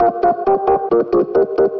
patterns of funk, Electronic Loops

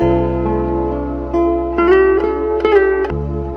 guitar

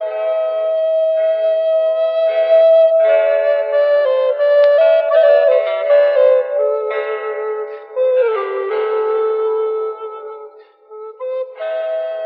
flute, Jazzmatazz loops

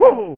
"woo" cartoon; ooh ahh; ho hoo woo

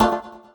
ragga, stabs

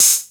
Hats Electro Open

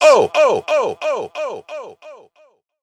oh; Voice

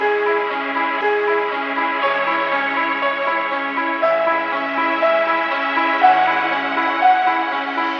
piano and strings, Sad; loops

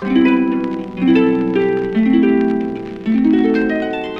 guitar magical; Voice

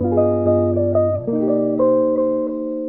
guitar

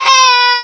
"ah", shouted; Voice; ooh ahh

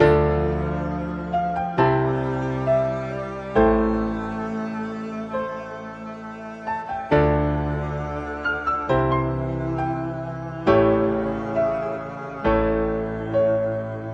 piano yearning, Sad; Loops

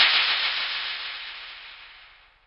prong, Ticks, Misc Percussion

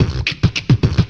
calypso, Beatbox, Drum loops

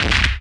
slap; Crash Bang Boom

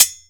bent, Bells

